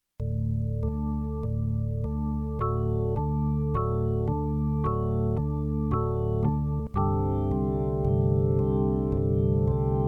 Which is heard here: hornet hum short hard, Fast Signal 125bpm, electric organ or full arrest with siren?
electric organ